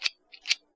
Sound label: lighter